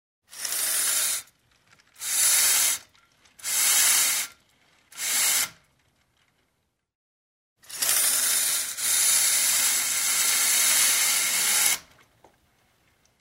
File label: Vertical blinds; windows